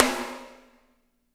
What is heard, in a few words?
SNR; Spectrasonics; Burning Grooves